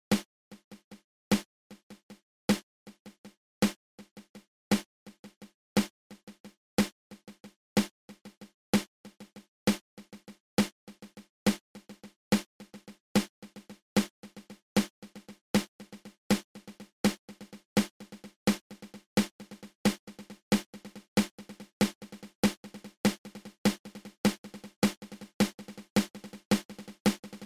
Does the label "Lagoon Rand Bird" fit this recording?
No